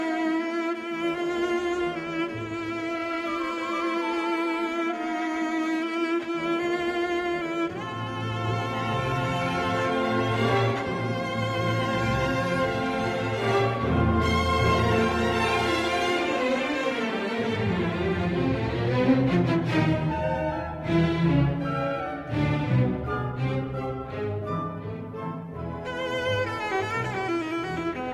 cello concerto no.1 in a minor op.33 i allegro non troppo